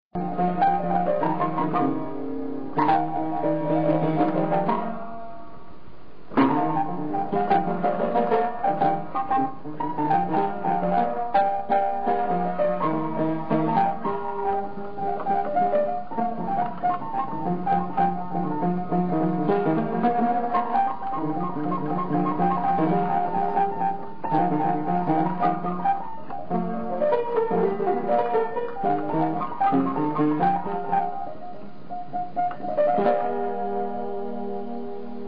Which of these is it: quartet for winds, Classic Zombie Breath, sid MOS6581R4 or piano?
piano